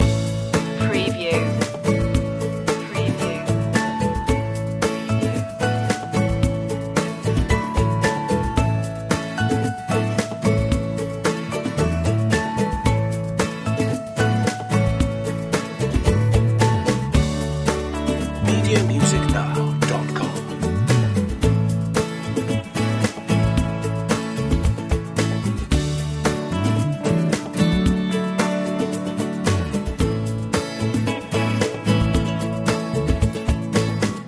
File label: moving forward loop